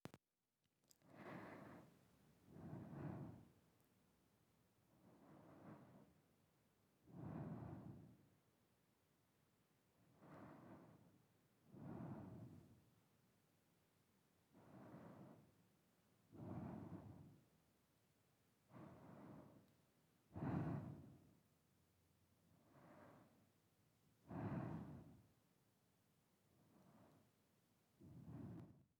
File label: Breathing sounds Φυσιολογικη Normal